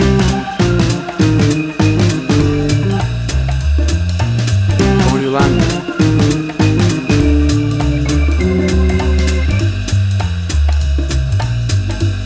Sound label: 60s Acid Jazz Music